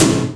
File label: R8 Tom, Roland R-8